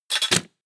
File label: mechanical lever metal small up